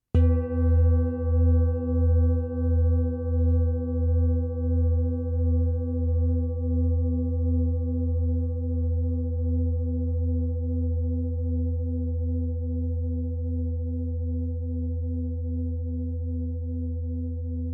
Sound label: chakra bowl